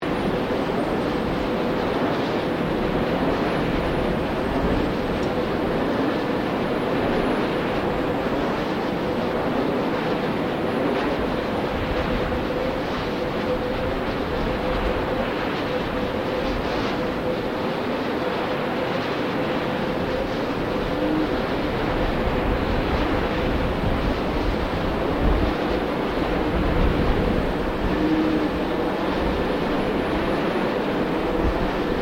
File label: Turbine Wind Power Turbine Loop, Wind turbine; Machinery; Machines